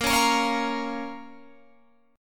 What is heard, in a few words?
Bb Minor, Guitar, Open G; 20,18,20,18,18,20